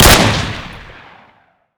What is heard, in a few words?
tavor; weapons; ModernWarfare